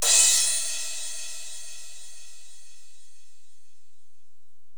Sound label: Korg x5, Drums